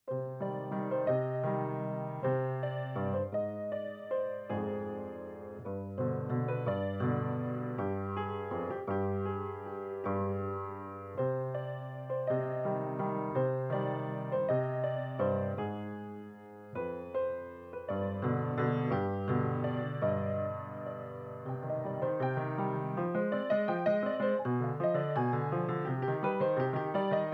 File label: Piano